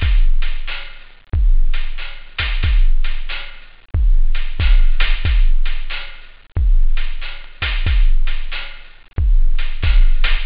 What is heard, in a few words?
tom, loop, house